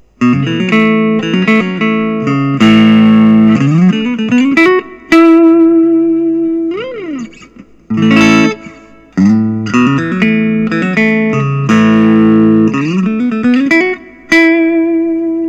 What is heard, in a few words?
collings i 35 lc deluxe; faded cherry